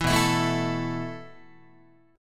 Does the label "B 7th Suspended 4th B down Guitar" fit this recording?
Yes